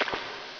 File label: step wet; metal gear solid